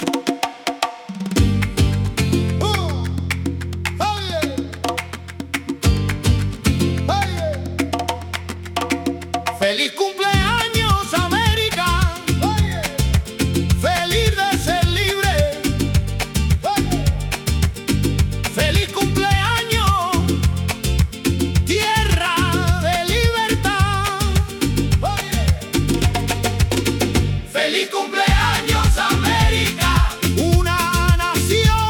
Rhumba

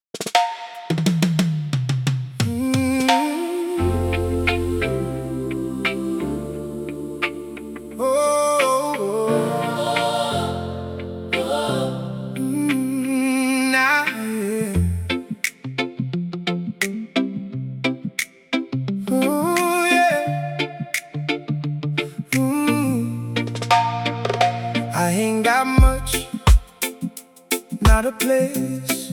gospel

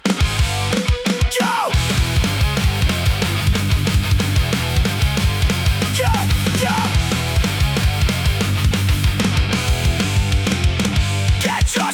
punk rock keys

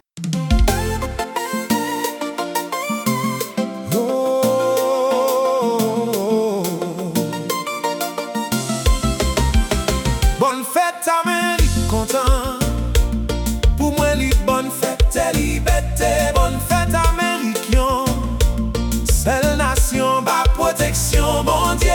Creole Party

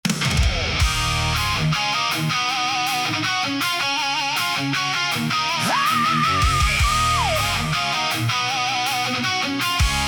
Heavy Metal

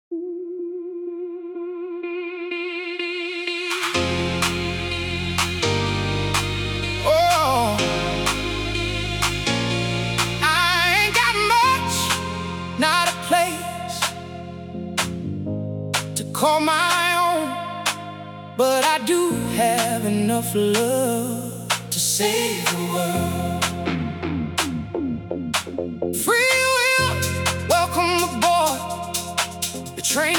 house techno remix

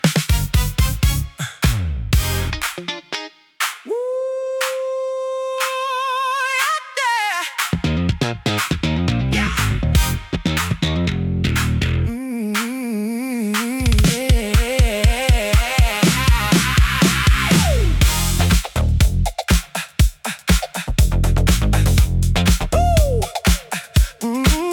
funky soul

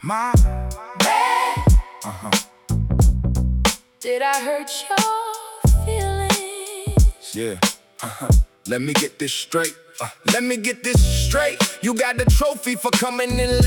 Happy Rapper Birthday Party

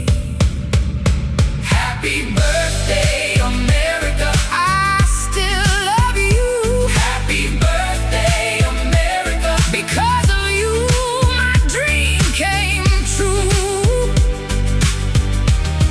remix

